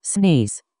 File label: sneeze